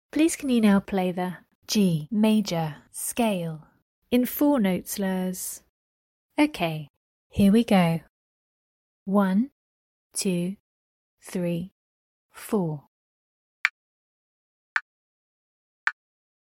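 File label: G major scale 4 note slurs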